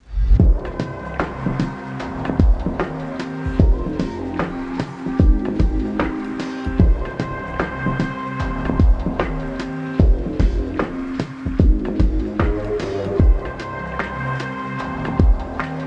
Gaming Soundscape

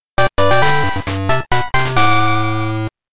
Fanfare! Get TM; Pokemon OST Collection; Pokemon Gold Silver Crystal OST

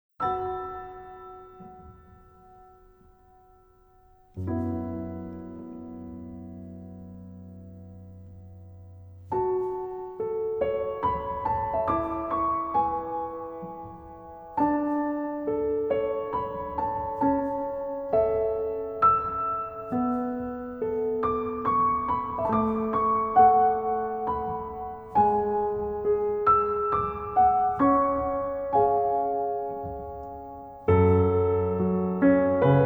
Piano Solo; Soul Link The Animation Original Soundtrack; Requiem

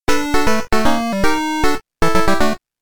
Fanfare!; Pokemon OST Collection; Pokemon Gold Silver Crystal OST

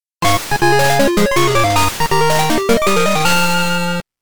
Fanfare! Get Badge; Pokemon OST Collection; Pokemon Gold Silver Crystal OST

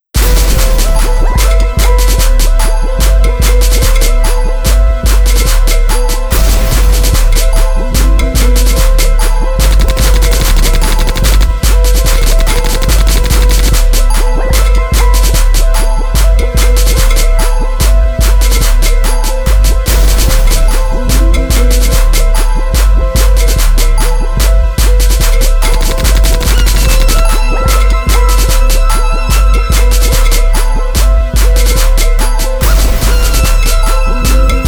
instrumental

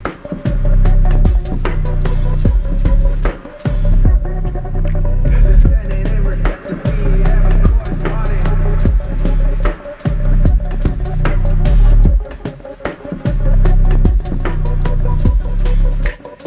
Gaming Soundscape

